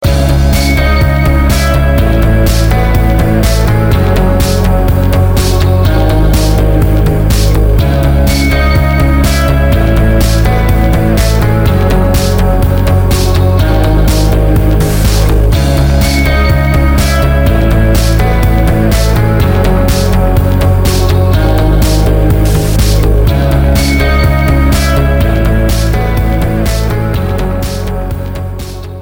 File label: Minimal